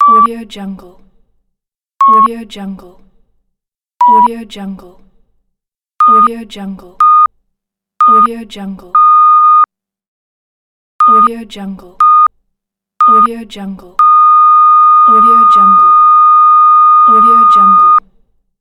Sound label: beep tv pack